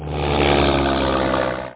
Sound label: plane; Japan; Panasonic